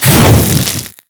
Hammer Boss Ground Fire Start; Warframe; Grineer

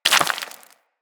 Bullet Impact Debris Rock

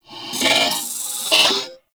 Purgatory Warrior Possess Scream; Warframe

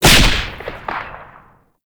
Whip Crack; Oraxia Whip

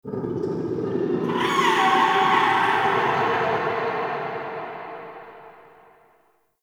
Growly Overtone; Ambience; Warframe; Shadowgrapher

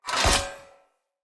Great Sword Equip End; Weapons; Swords; Dendrit Ara Great Sword

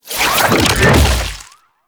Gouache Teleport Out B; Warframe; Follie

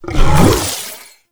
Flying Mouth Attack Fire; Enemies; Warframe; ManInTheWall

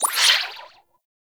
Sticker Equip Menu Close; Gameplay; Peely Pix; Warframe; Nineteeen Ninety Nine; Deep Archimedia

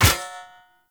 Short Sword Medium Parry Impact

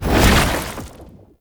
Wall Climb Deactivate; Warframe; Oraxia

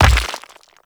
Brawler Rock Elemental Footstep Default C; Warframe; Warframes

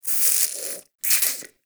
Wheeze Close; Ambience; Warframe; Infested Eris

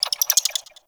bird clicky; Ambience; Warframe; Eidolon Plains

